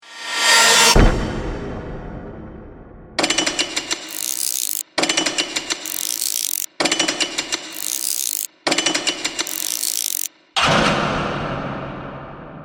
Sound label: Production, Element, Composition, Winding, Clock; Compositions